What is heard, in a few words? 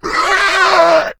death agony; the monster plane; kolmods